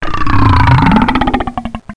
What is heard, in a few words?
Virus Belch